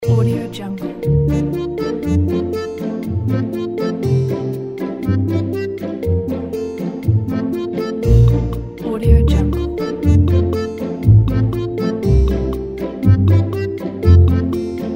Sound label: childrens book background music; marketofmusic